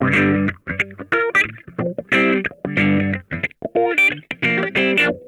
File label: MAD DOG, Funk Guitar; 90 s Sample CDs; Zero G